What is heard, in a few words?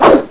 miss; katana